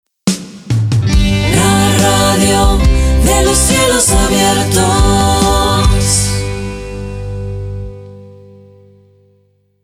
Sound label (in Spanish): Jingle Cantado; Slogan La Radio de los Cielos Abiertos